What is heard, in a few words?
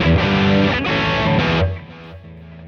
90 Chorus Rock E; Loops